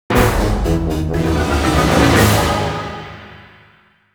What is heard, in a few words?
(Jingle) Minigame Lost